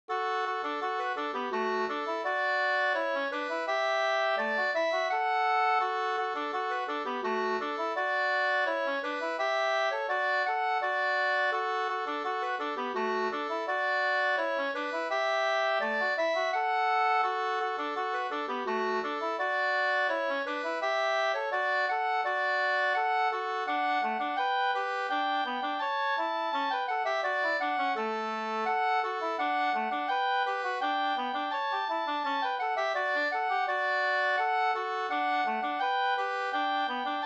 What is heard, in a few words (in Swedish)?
polka polkett, 2st